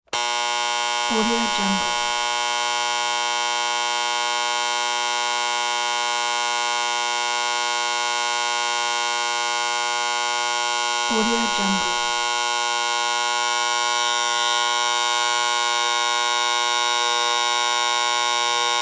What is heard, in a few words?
alarm clock buzzer; sound-ideas